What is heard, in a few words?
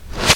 reverse